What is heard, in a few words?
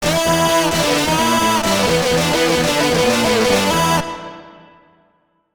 Guitar; Donkey Kong 64; Guitar Gazump